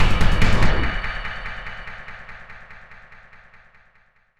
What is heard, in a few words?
Boss Outro; Insanely Twisted Shadow Planet